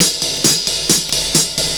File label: CYMB, Breakbeats; USB Soundscan vol.46 - 70 s Breakbeats; AKAI